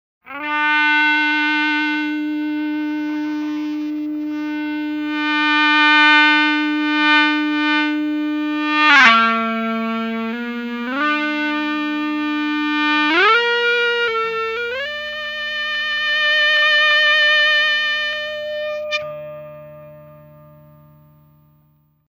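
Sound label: Egtr ebow, electric guitar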